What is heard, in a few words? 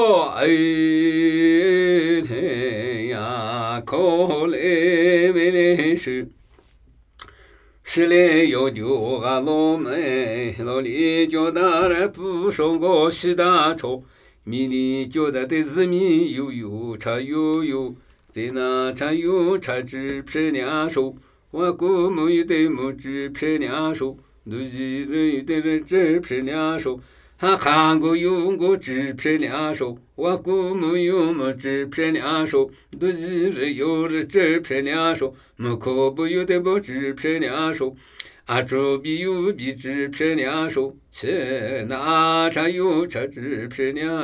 Namuyi Tibetan Ritual Chanting